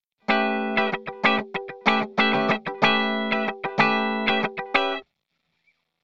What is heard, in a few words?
Funky